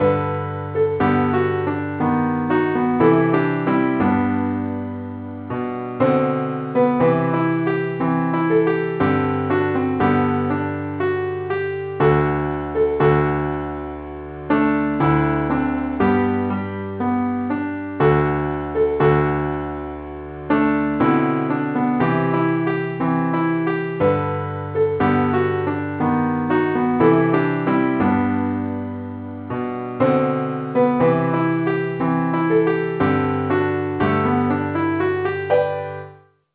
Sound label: keyboard Only key C